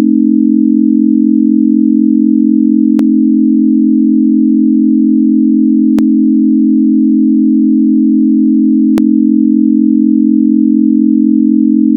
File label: 567 buzz test